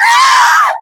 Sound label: pain; Awesomenauts; Qitara